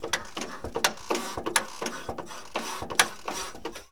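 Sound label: Plane P40 Lever Emergency Pump; Airplanes; SFX Kit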